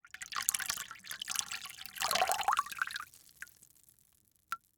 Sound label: Liquid Water Pouring Into Water In Plastic Bucket Short Slow